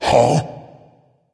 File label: confused dragon; fafnir